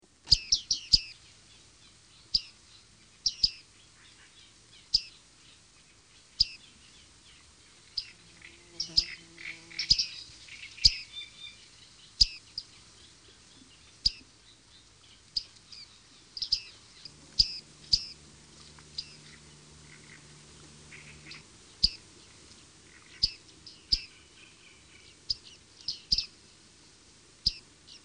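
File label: Bearded reedling; Madarak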